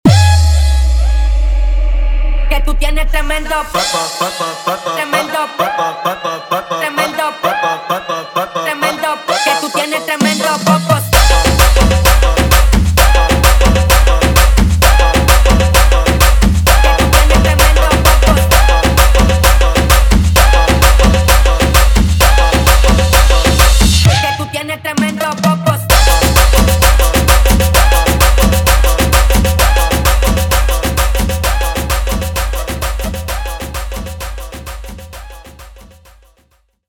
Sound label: guaracha mashup 130 bpm; Reggaeton dj remixes; Prodeejay Remix